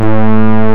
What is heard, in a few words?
analog